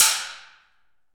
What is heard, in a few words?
Aluminum Wall, FX Perc; Roland L-CD701